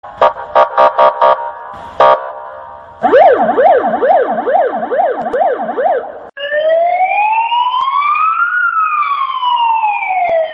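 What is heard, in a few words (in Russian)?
sirena dps, police siren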